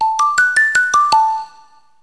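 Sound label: up down xylophone; music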